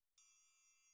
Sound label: TRIANGLE CLOSE